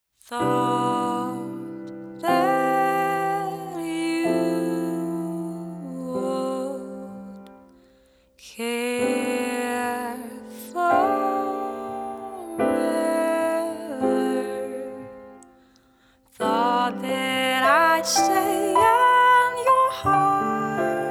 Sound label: Swedish breath